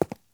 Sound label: Cement Foot Step; welcome to the game ii gamerip 2018